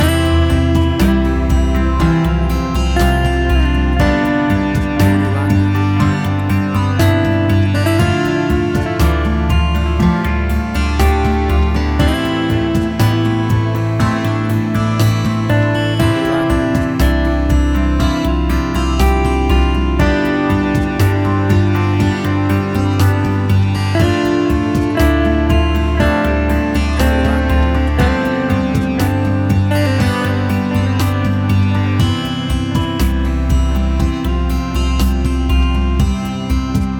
Acoustic Mystery

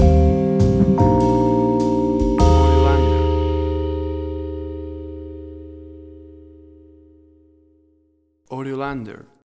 Auld Lang Syne Christmas Jazz Stinger

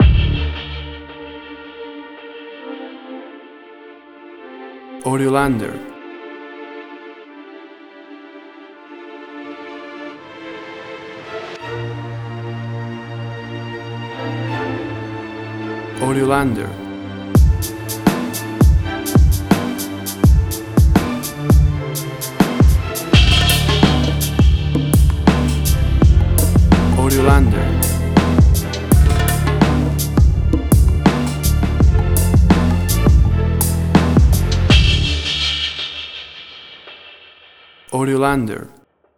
Torment Orchestral Hip Hop

